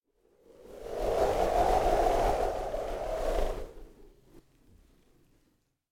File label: Wind Gust High Whoosh Cold Blizzard; Hollywood Edge; sound designer's toolkit v2